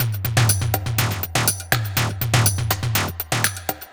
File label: 122 Old Skool Jam Perc, Drum Loops; 122bpm; Nu Dance Electro